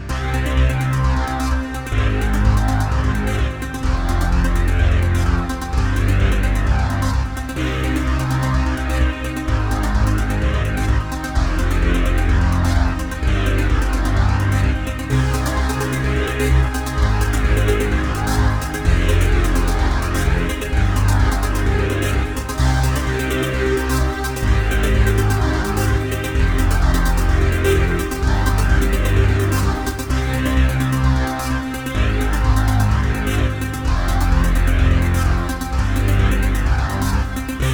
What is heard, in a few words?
Zombie Game, horror surreal